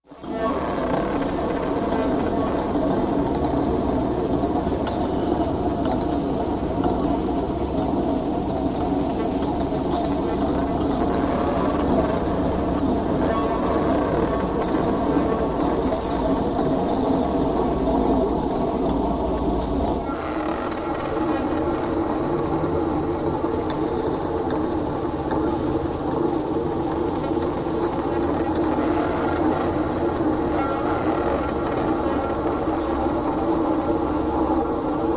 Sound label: Pulleys Machine; ambience